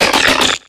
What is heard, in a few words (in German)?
Ruf